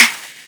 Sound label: snare; 7rystan's Snare Pack 2018